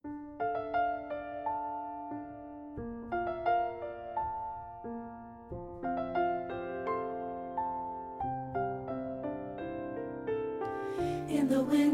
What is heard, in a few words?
SSA (Alto Dominant)